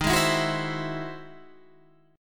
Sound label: E Augmented Major 9th